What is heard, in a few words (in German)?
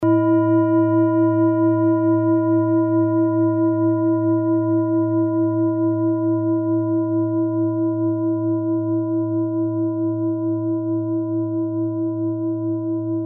klangschale tibet